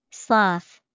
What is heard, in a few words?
sloth